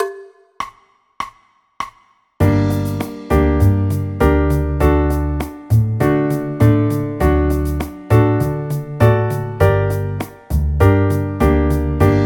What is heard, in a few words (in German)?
g moll akkordverbindungen